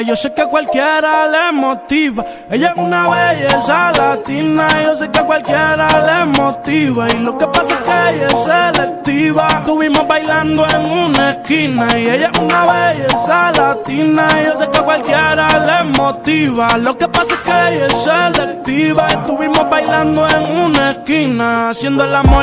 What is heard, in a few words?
Latina